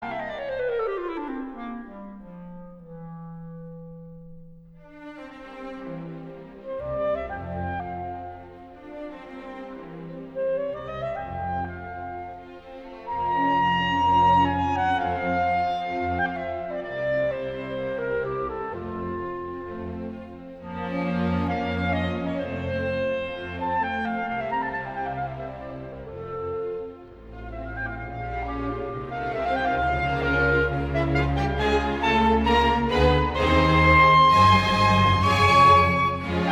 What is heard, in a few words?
clarinet quintet in b flat allegro